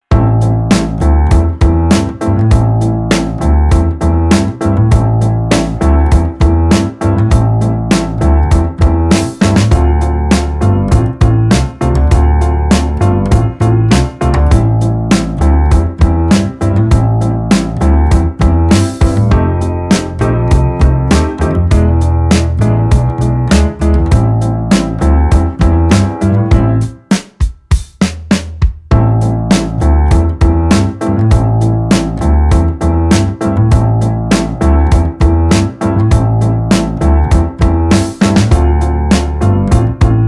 Hit Me, blues, 100 bpm

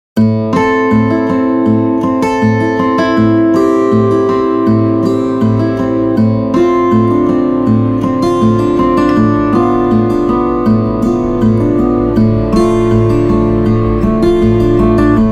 folk music, 80 bpm

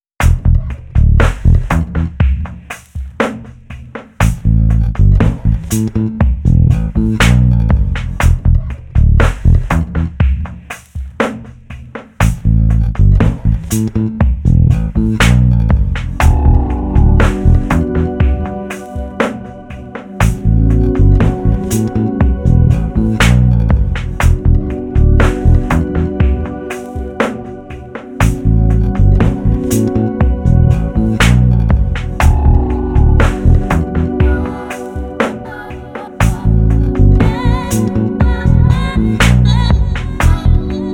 60 bpm, blues

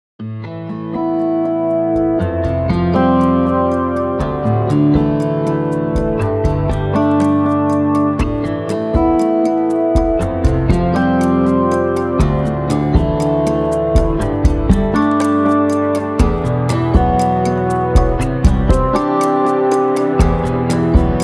folk music

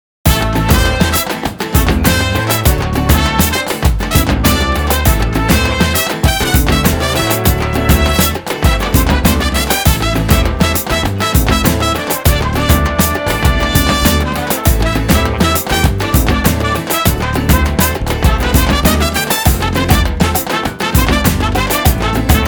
folk music

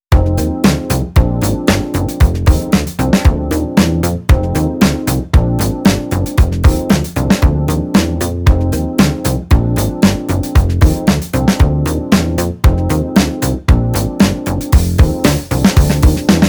soundtracks

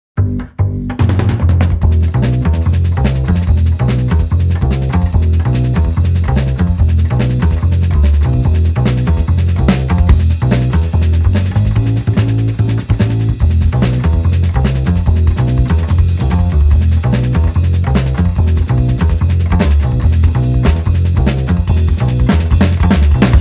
folk music, 145 bpm

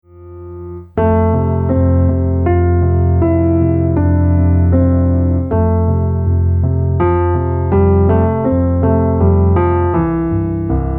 piano

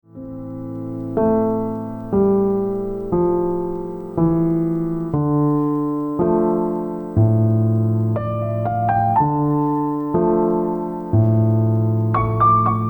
piano

